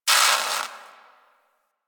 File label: Destruction Electricity Sparks; Warframe; Corpus Railjack